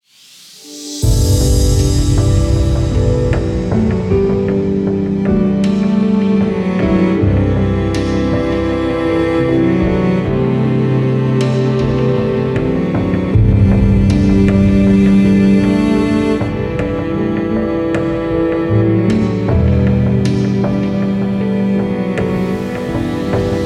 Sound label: Emotional